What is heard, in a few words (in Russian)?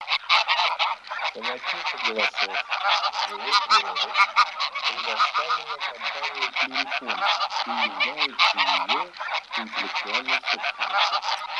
Гусь серый базар Oche Selvatiche; chip mini 234 plurifon